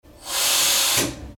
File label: train fizzling compressed air